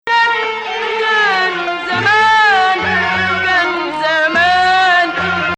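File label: Ajam Hijaz, Arabic music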